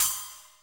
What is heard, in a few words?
Real Open Hats